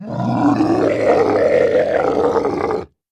Voices gargantu death; Plants vs. Zombies

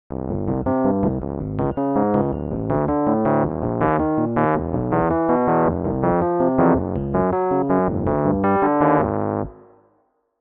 elec piano; hot lava; ios macos windows

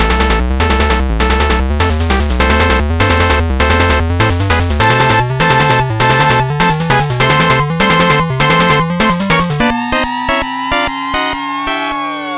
Battle Intro; Twin Blades of the Three Kingdoms

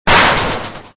Chair Impact; Extra Lives

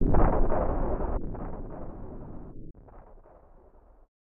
Explosion; Metal Slader Glory Director's Cut; snes

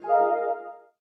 ui notif connect psmove; Just Dance 2018

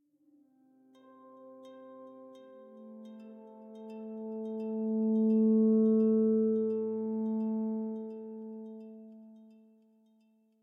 Tension Creepy; nova 111; ps vita

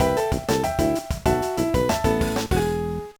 Jingle, gba; Backyard Baseball 2006

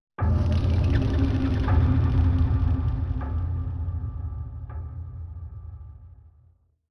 Music Stingers Stinger; Hunted: The Demon's Forge; x360

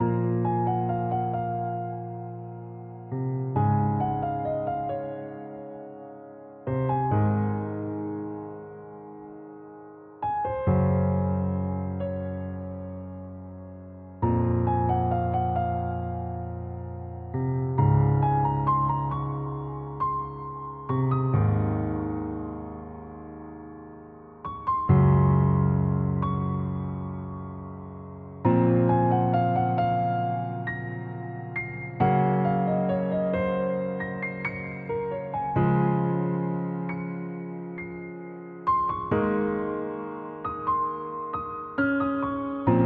Suspenseful Solo Piano Looped; bakemono demon brigade tenmen unit 01

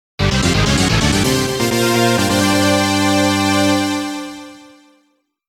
Lucky 7 Jingle; Jikkyou Powerful Pro Yakyuu; Power Pro Music Hall 2

